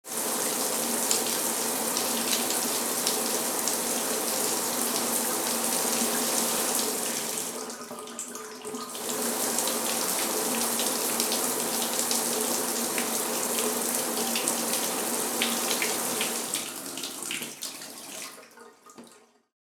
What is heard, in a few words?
Shower running inside heavy water pressure